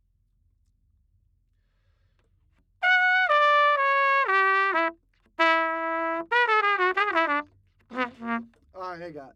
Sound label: Trumpet